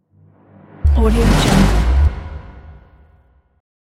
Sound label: epic reverse